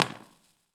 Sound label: Battery Tolles